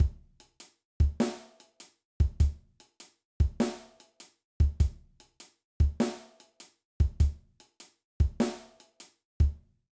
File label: Shuffle Groove, drum